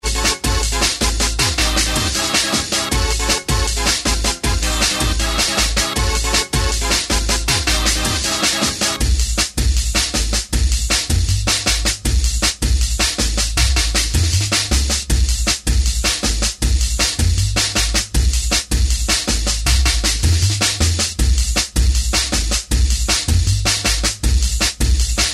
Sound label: excitement dance jungle drum n bass